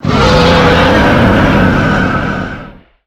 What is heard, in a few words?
summ tentacle